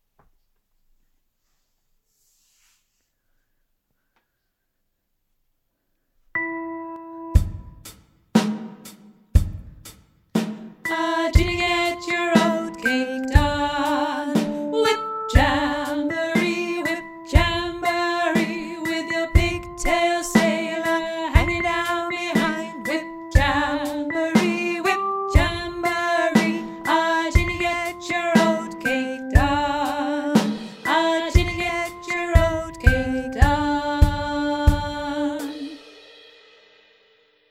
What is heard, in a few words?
Alto